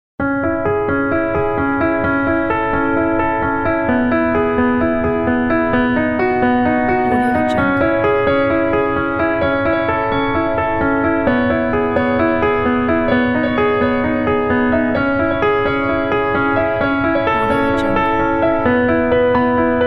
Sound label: inspiring emotional pack